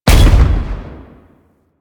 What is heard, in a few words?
Army Proj Explosion; Warframe; Warframes; Hoplite